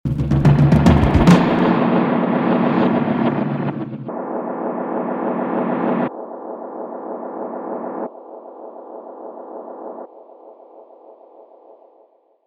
reversed delay

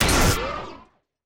shock fire layer

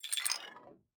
windchimes robot effect

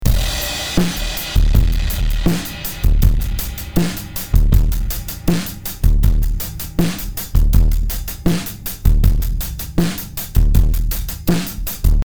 drums smashed

